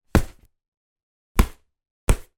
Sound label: toy bop bag inflated punches